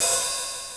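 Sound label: open hihat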